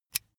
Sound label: pull out usb cable